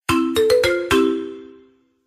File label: notifications, Naughty